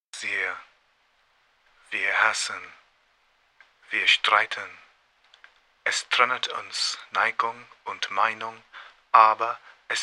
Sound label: Fm 60 vocoder